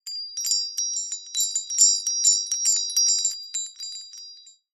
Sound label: household wind chime metal small short ring